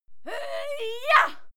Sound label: female fight scream yah struggle